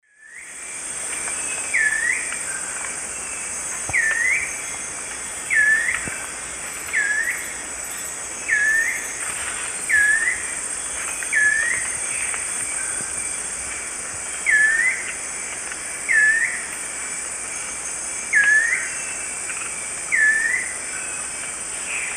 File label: Chiroxiphia pareola, call; S. America; Eastern Lowlands